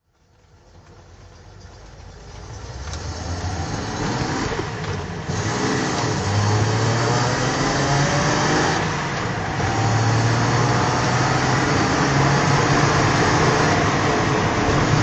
datsun 240 z rally drive

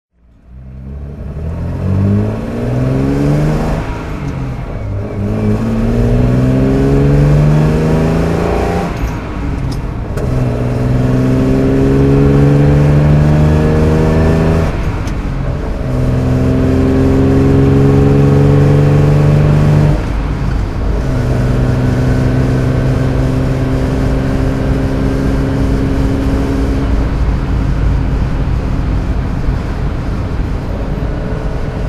maserati sebring 3500 weber driving; Maserati Sebring 3500 GT I series 2, 1965; Engine sound driving; V3D/ ClassicarImages

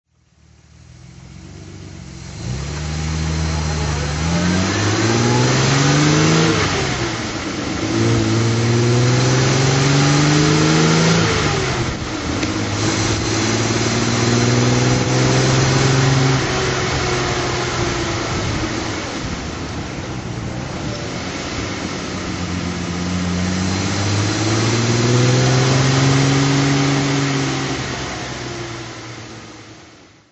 healey 3000 mk2 drive

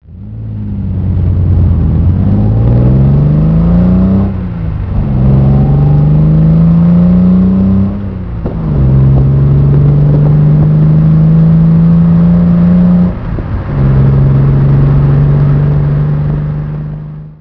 tr250 drive

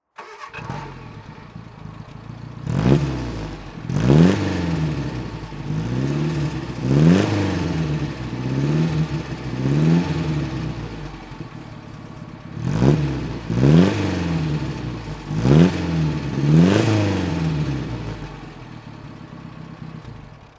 ford anglia throttle

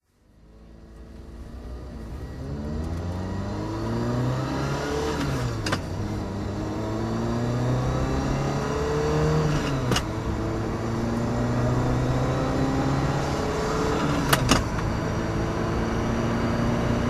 ferrari mondial t driving

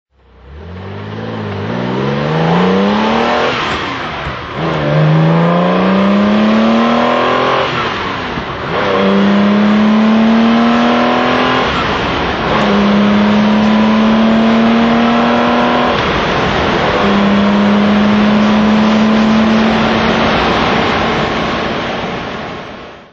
porsche 914 6 drive

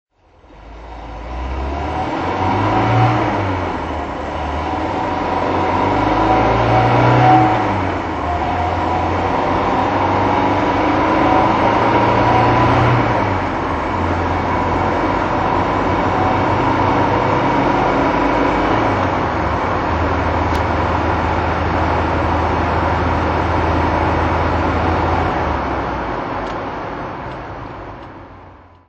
volvo p1800es drive

